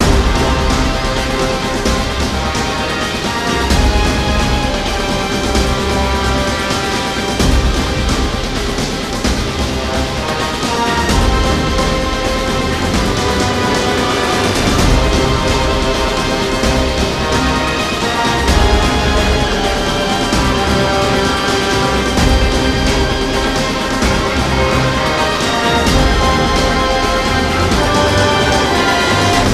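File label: fight loop; transformers war for cybertron gamerip; COG FIGHT PRE COG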